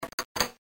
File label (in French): Fermeture Petite Porte